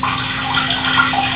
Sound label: piss